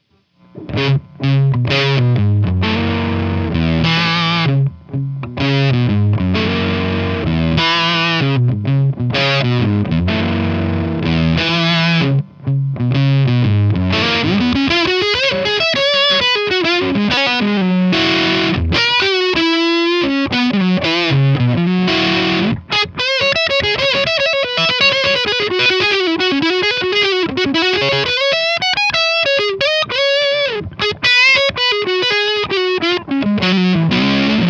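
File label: Legend EM12 Overdrive Lead, eminence; Overdriven lead; Josh Smith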